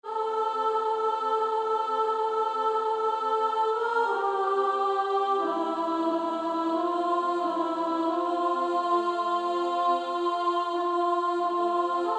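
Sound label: Female Choir, soprano; Onward Christian Soldiers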